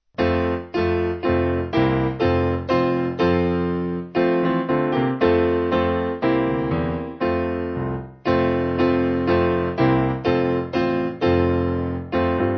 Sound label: Piano